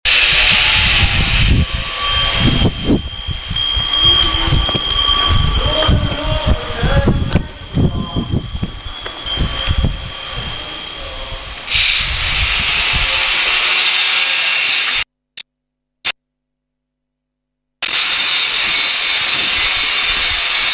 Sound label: budists and drills